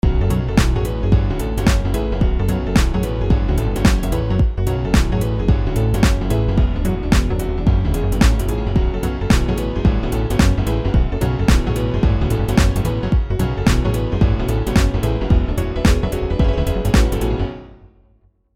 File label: thirds